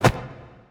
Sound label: victory hit; smite; chiron